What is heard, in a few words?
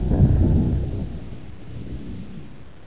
distant mortar, ambience